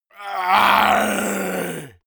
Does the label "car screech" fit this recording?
No